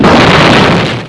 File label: Ship Die; Casady & Greene; Crystal Crazy Demo